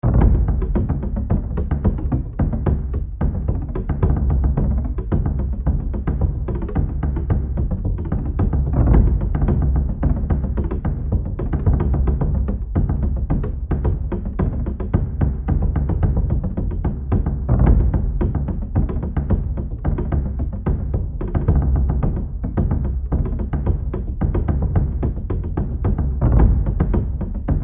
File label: War Drums Early; Civ4 beyond sword; Diplomacy